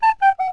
riot ring out; springriot

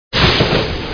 storm; citystorm

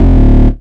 reject

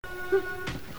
Beep; Kelly Hu

